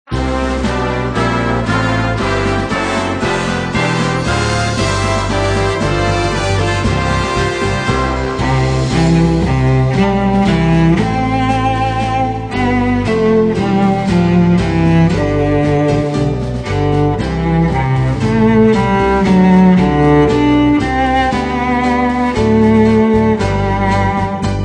Instrumental Hymns

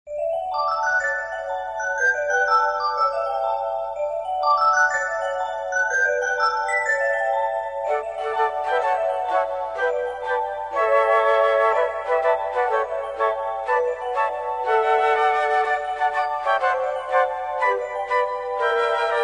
Orchestra, Strings; Majesty Orchestra

